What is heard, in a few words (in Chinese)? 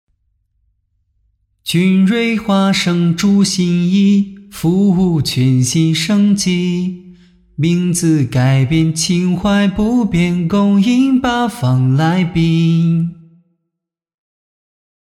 唱歌 改编